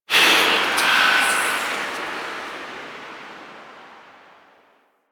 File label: Tailor Shop Door Whispers; Warframe; NineteenNinetyNine